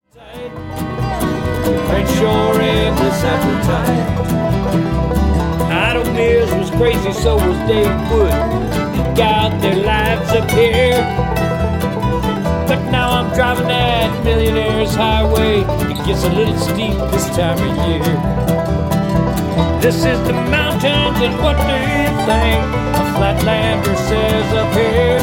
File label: String Band